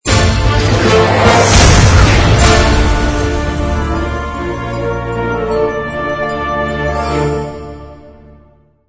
Cinematic Logo

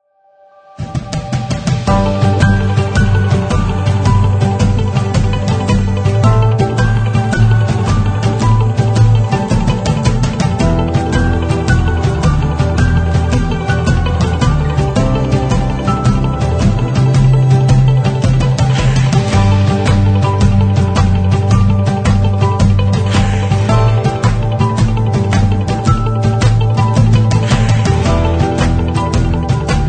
Inspiring Motivational Corporate

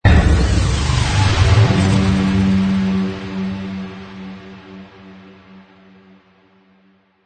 Apocalyptic Riser Ambient Transition; Tempo: 120.0

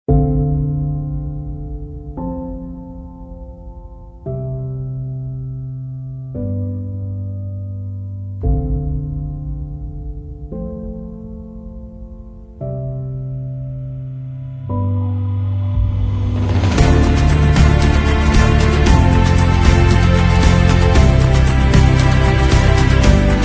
Cinematic Inspiration Short

